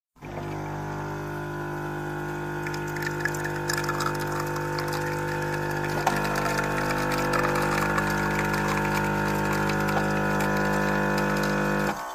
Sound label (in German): Kaffeemaschine